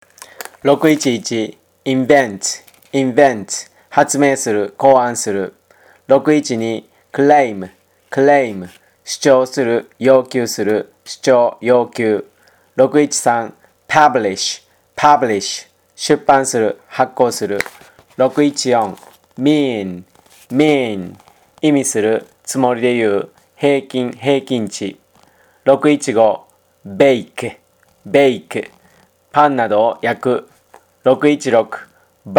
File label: tango